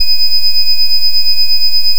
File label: additive cello single